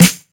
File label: clean snare sample f key, steel snare drum sample tuned to the F note; snare drum samples